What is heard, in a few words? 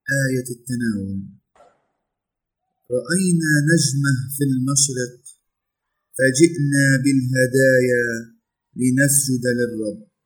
Communion Antiphon; Epiphany of the Lord